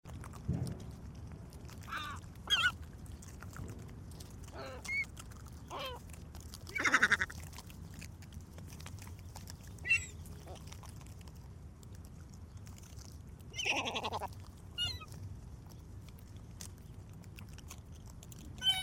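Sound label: gulls feeding at a dump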